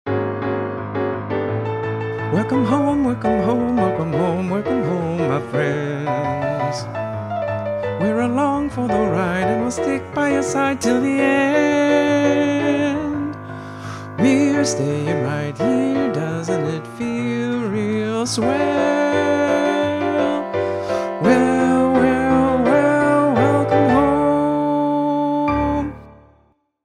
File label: vocal guide; audition